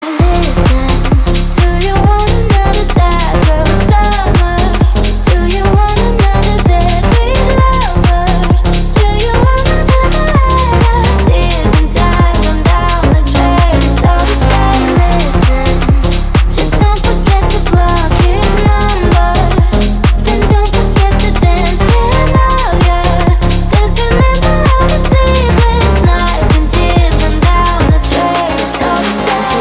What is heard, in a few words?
remix